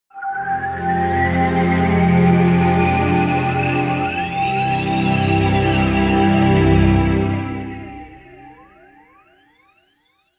Scifi Audio Logo Ident Space Wind Orch Build Swirly; Scifi Audio Logo Ident 51,Space Wind, Orch Build,Swirly; Sonic Logo Sound Effects